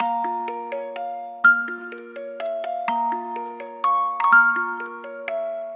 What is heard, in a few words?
music box; Identity V